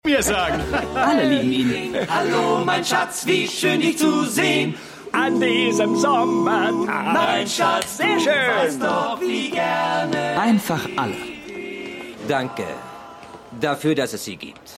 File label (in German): Gesang; Scrubs